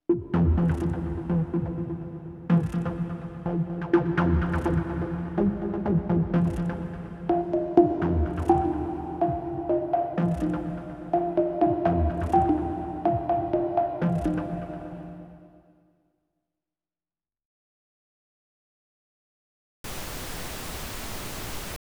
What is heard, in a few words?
technomatic synths